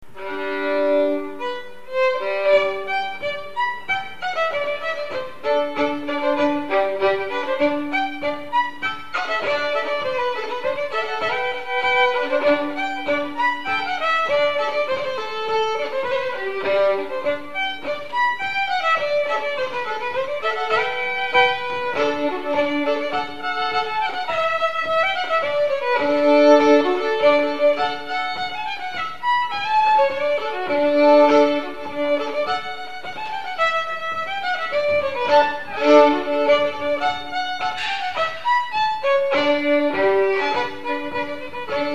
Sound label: polka; Skolelaererens polka